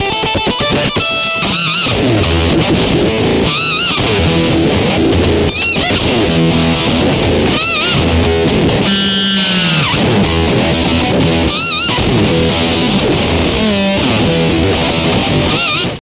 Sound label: fiddling, guitarra; loops; sampleswap